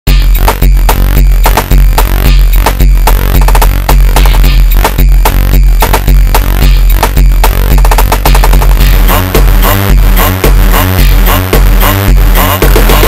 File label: slowed